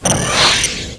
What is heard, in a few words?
arm Swap; PC Gamer IT CD 48; Big bang